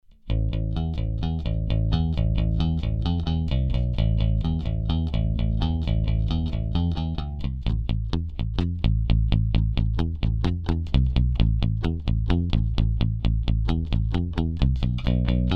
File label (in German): Plektrum mit MutePads offen und Handdaempfung